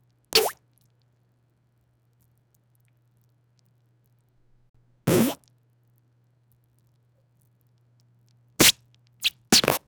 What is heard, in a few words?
squeaky wet rubber bursts